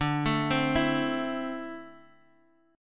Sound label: D 7th Suspended 2nd, Guitar, Open D